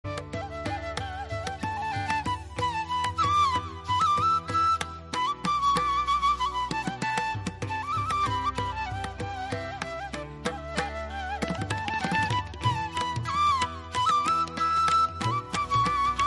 instrumental song

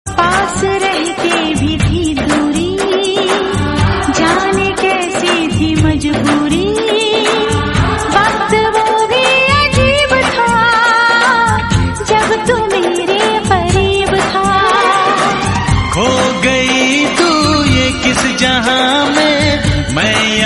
female version hindi

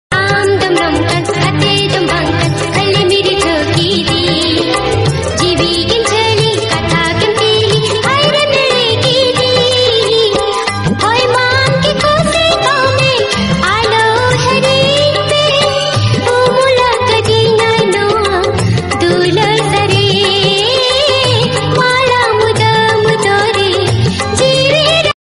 new santali

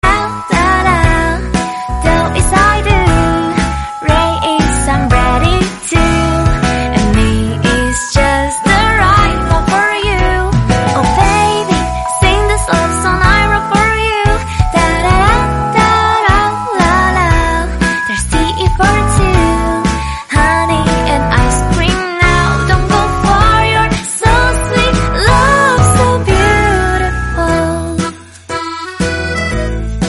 chinese drama song; love is sweet

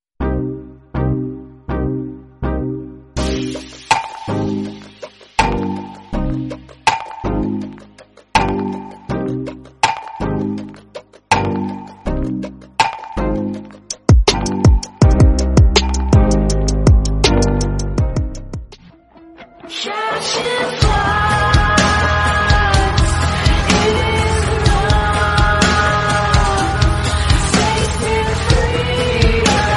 theme

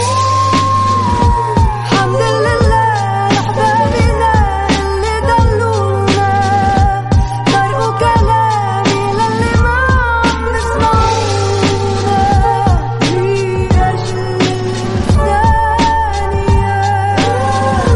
islamic naat

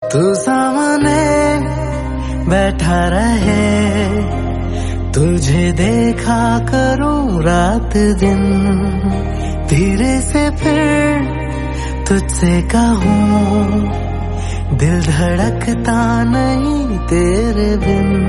hindi song

